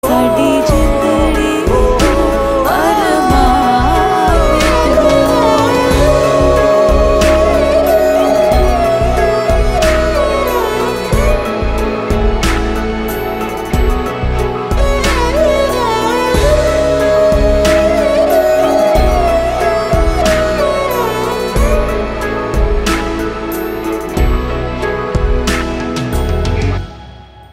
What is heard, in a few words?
Instrumental Ringtone